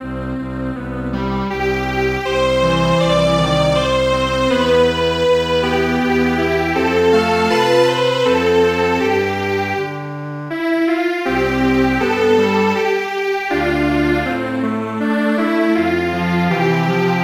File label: Serenade Strings Quartet; midi; traditional